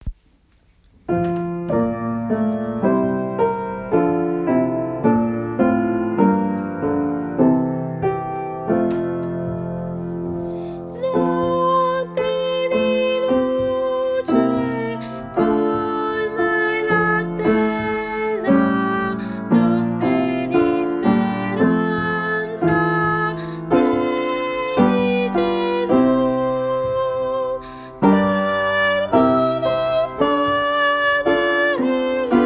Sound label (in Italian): notte di luce tenori